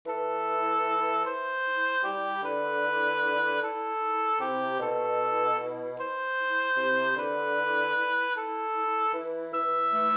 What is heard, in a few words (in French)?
melodie